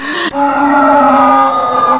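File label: zombie infection, zombie plague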